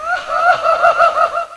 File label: Female amb efx; Urban Shakedown